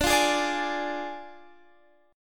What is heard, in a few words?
D Diminished Guitar Standard, chord; 22,20,18,19,21,x; Ddim strummed